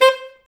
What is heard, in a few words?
SAX A. STAB, Alto Section; Alto Sect FX; Roland L-CD702